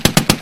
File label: MGLAUNCH; 3D-Airforce-Simulator